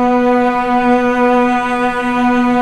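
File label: cellos B3, String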